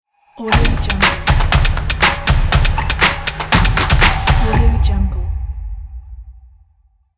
basketball stomp logo

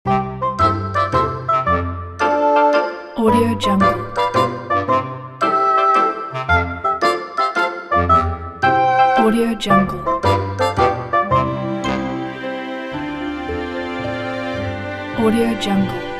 sneaky comedy ident; shorties

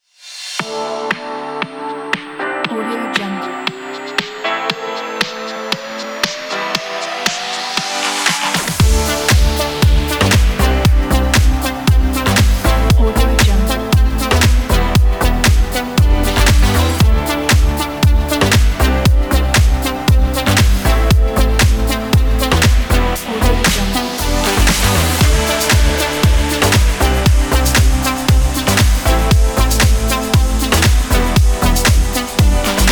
promo fashion pop

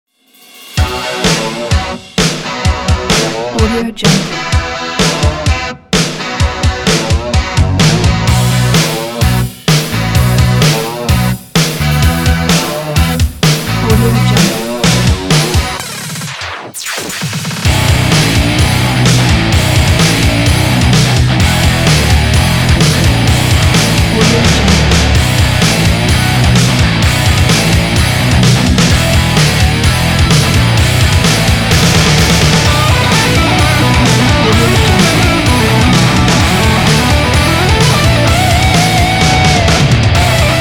upbeat hard rock

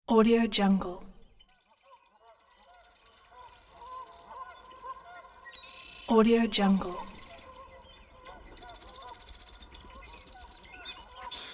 early morning birds

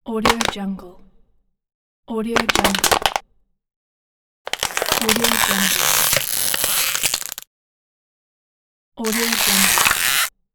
can squeeze

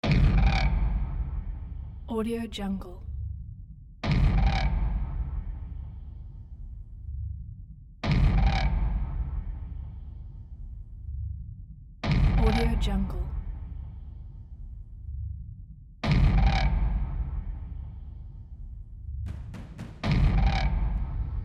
dark distorted trailer percussion; josiahjohnsonstudios

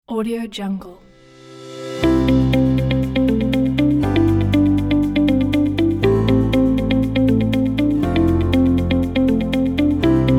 motion and emotion corporate pack

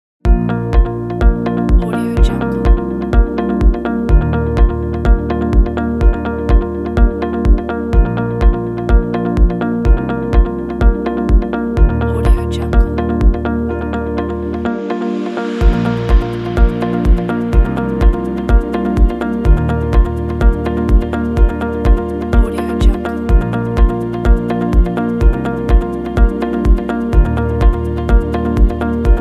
corporate is corporate

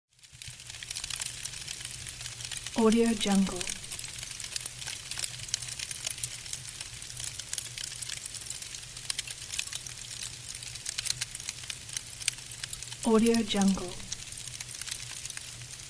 embers crackle

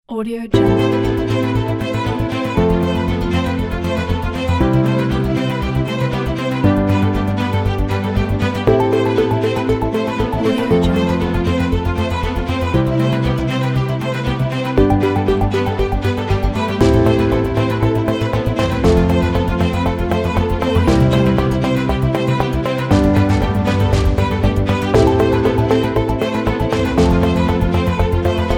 climate control

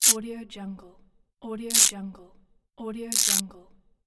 velcro

